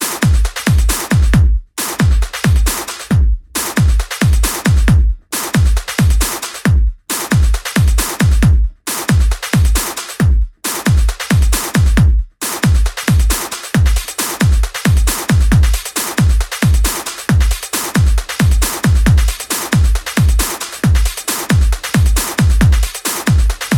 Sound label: bells of heaven instrumental mix